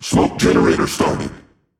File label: Smoke Start; Consumable; World Of Warships